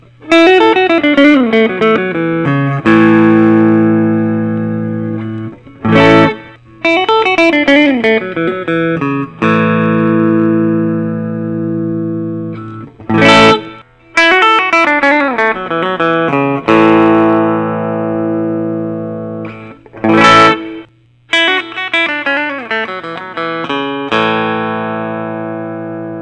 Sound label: lsl instruments soledad; ruby